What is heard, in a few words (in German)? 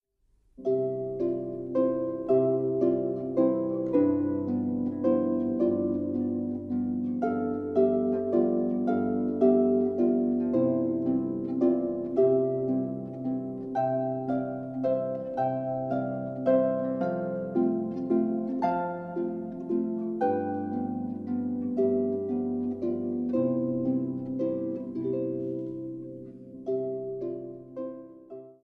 Walzer